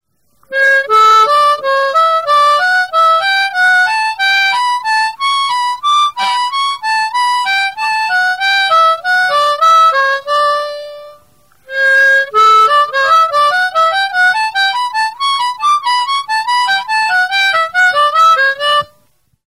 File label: sliding, harmonica